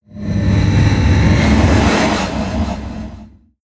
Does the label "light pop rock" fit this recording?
No